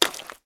mud step; Golden Crayon Sounds

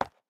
concrete step; Golden Crayon Sounds

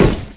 warehouse step; Original TK Sounds

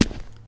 concrete step; Golden Crayon Sounds

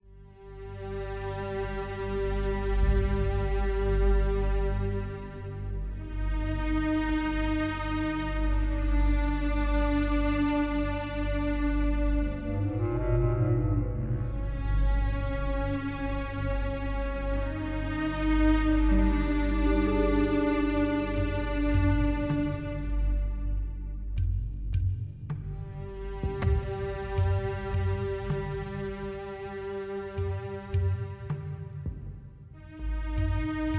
cave; Manamon Music; Manamon 2

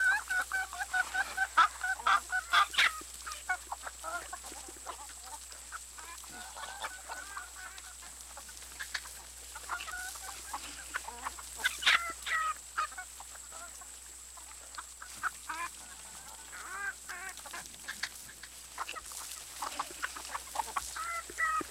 farm; Golden Crayon Sounds

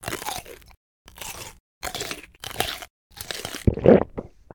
eat chocolate bar; Original TK Sounds

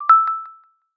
Pairing Mode, AirPods Pro 2 Case Sounds

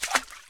water step; Golden Crayon Sounds

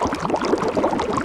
boiling; Golden Crayon Sounds

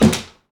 door close; Golden Crayon Sounds

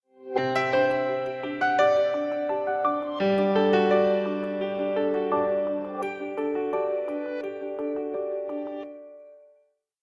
Sunrise, Cisco Ringtones

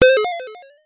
double letter bonus; RS Games Sounds; Scrabble

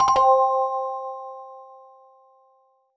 Bubble Disappear; android sounds for iOS

